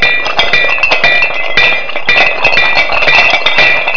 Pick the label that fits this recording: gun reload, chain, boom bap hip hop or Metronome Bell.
chain